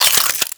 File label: Modular Secondary Handle Slow Reload, Guns; Warframe; SU Modular Guns; Reloads